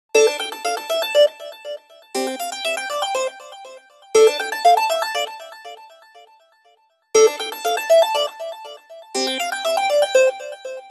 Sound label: Ring Drive by; Windows Mobile ringtones; Microsoft